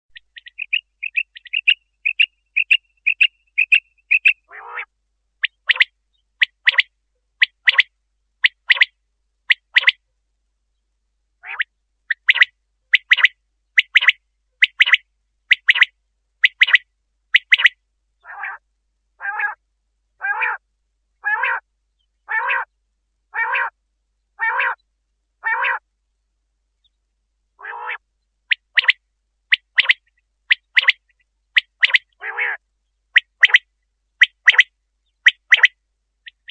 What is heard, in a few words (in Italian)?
Quaglia; CANTI SAG NATURE 2024